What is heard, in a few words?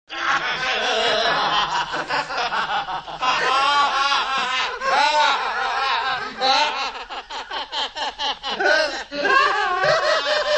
2 men laughs stereo